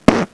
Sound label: SQUISH; VERMIN